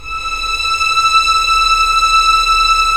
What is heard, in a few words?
STR Violins, Roland LA Composer; Roland - LA Composer vol. 4; 90 s Sample CDs